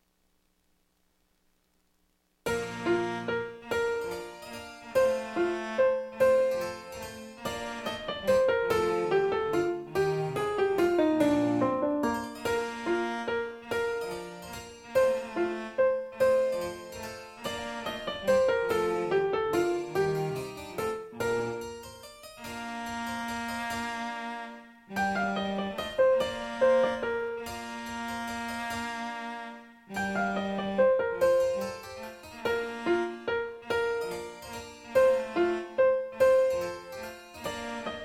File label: clarinet solo piano; le petite rien